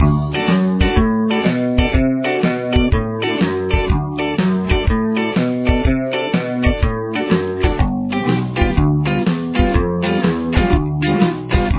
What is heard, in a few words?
backing